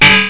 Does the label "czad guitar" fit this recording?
Yes